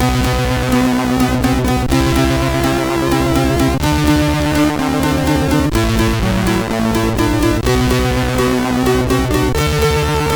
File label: Sounds, sid MOS6581R4; HVSC; BSP-Sounds